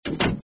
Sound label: door shut; FlashChat v3.931